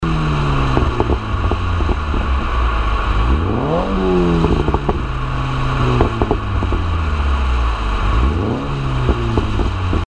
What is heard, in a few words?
Burbles Sound Example